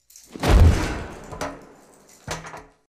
metal door closes

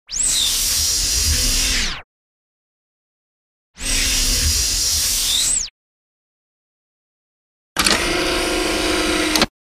Hydraulic door

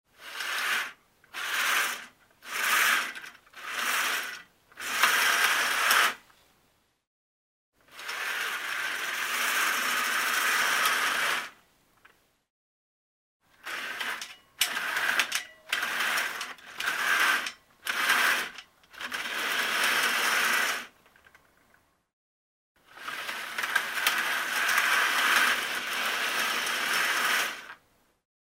Curtains open and close; windows

